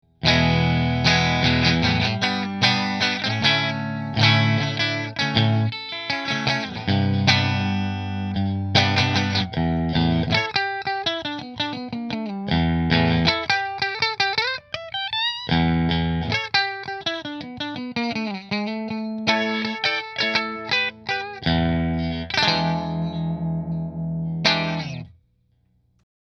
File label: Silverado Position 3 Fender